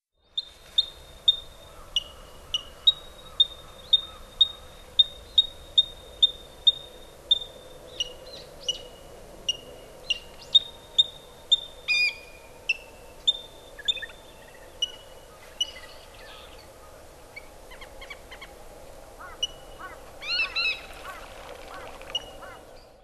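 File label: kaitsuburi, Little Grebe; hyogo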